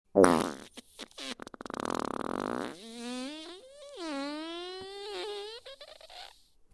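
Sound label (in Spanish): pedos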